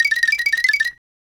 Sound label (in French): oiseau nerveux